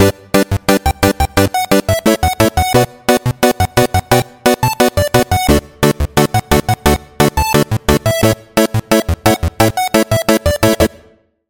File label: Chord; Routed Sound; Sylenth1 Expansion - Hyper Gamer Vol.1